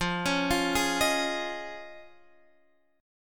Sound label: F Minor 7th Sharp 5th, F; down; chord shape